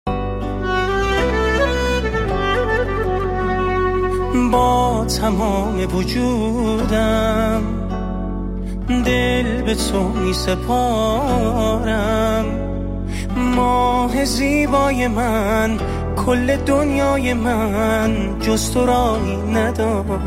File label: Tango Dance Musics